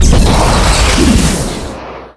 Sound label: gep gun fire; deusex